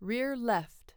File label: Rear left; SBLive; Creative